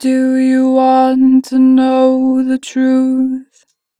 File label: The truth 2 bar flat, Vocals; Harmor Vocals; Pitch Flat